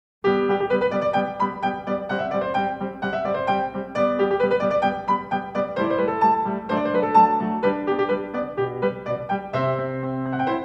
Concerto In G Minor For Flute Strings And Basso Continuo; Flute Strings And Basso Continuo - Allegro